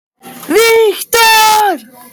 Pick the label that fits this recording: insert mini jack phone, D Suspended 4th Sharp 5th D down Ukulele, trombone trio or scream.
scream